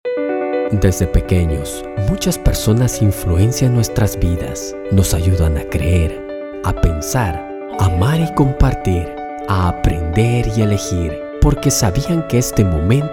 jingle

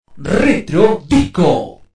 retro disco

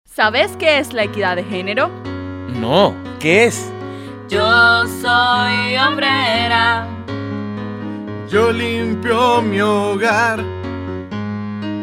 jingle; equidad de género

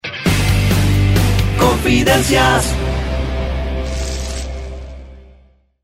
jingle; confidencias

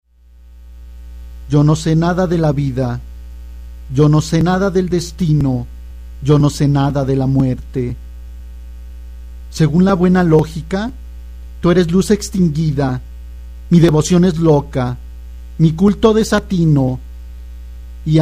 bass

